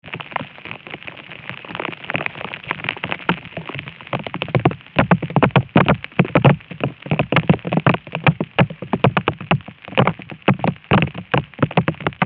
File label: raindrop rhythm